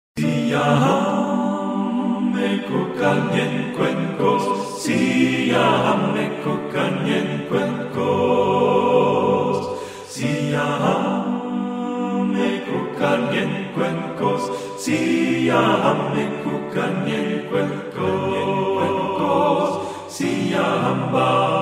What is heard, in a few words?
Siyahamba Tutti